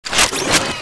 cart second draw, weapons

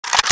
akm draw

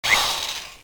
drillgun draw

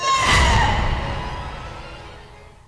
deploy, zombie bomb

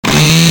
chainsaw attack start

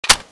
railcanon clip out

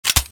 mauser96 bolt pull

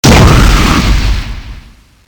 explode; weapons

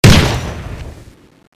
m60 shoot

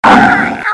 resident hurt; cso

